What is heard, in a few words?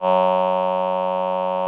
VP-330, String Machines